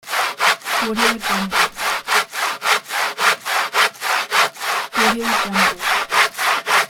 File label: wood cutting